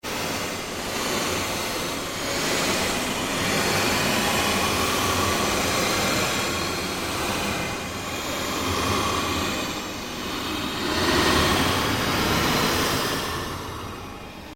Created Fluctuation Fade, Rise And Dive Sound Effects; Sound effects created by manipulating other sounds; Rises and Dives